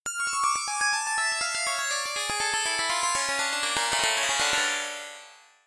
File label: synthpiano